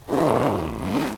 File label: ZIPPER